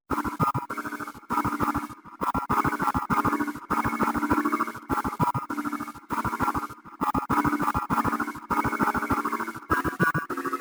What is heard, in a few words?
Motors; Umlaut Audio